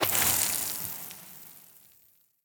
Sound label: liquid; teardown; ps5 windows xbox series xs